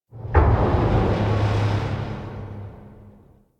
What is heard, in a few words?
Corpus Ship Rumble; Warframe; Corpus Ship Remaster